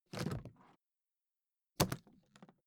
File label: suitcase lift up hard